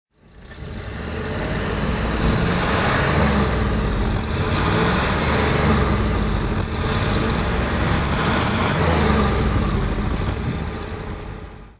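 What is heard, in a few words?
bentley s3 throttle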